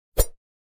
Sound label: join exit; sling driver